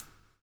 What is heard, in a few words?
BLUES TK, Backbeat; Spectrasonics - Backbeat